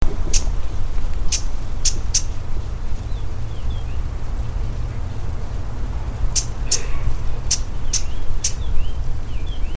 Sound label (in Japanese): uguisu